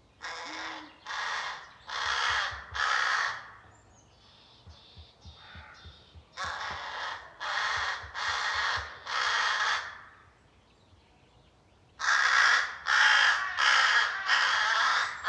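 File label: hooded crow corvus cornix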